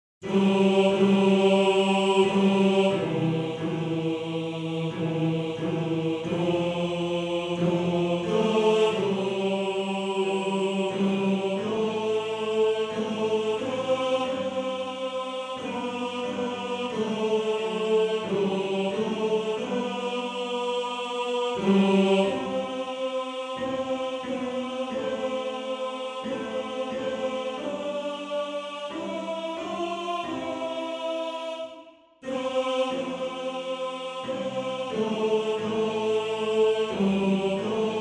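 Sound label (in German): Tenor 2, Männerchor; Morgenrot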